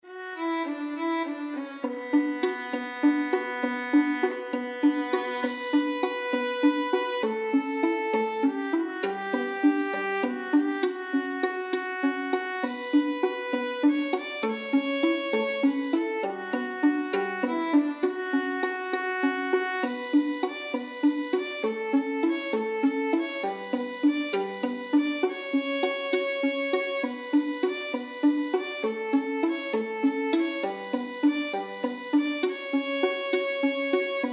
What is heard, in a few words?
spanish violin 2 violin